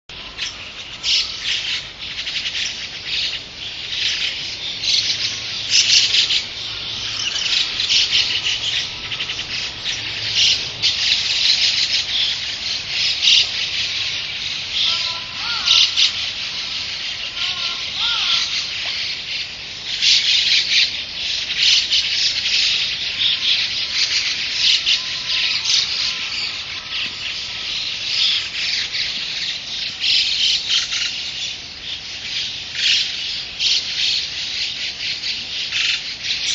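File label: mukudori, grey starling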